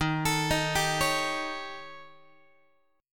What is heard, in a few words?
Eb 7th Flat 5th Eb, Guitar; arpeggiated; chord